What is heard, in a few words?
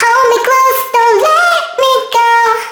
phrase Fm, Vocal; Slate Digital Omnivox Vocal Sample Pack